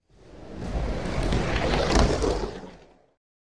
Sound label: Bowling Ball Rolls